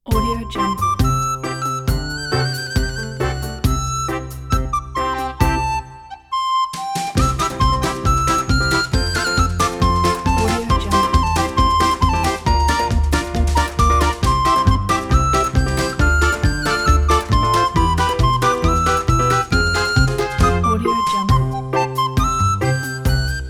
comic cartoon cute recorder

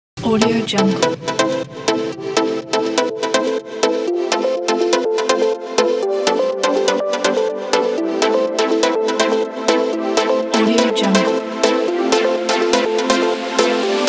for electronic; mrrevant

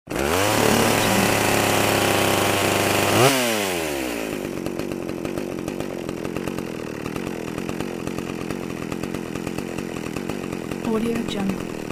chainsaw

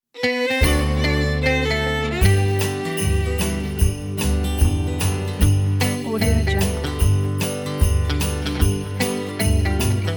jingle bells country christmas

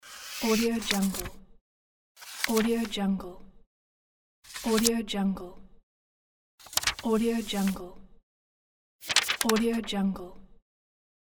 book page turning sounds; soundall

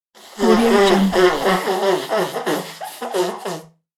blowing nose

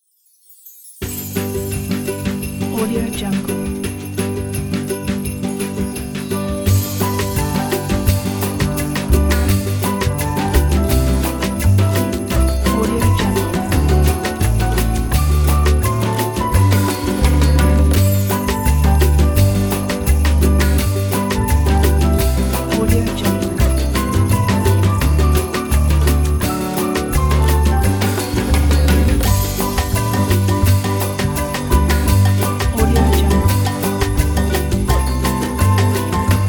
island jingle bells; gentlejammers-music

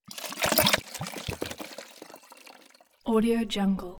waterflow; soundbits-soundfx

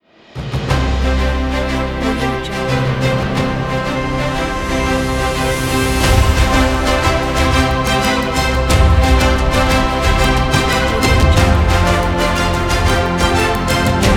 award show fanfare ident; puremusic

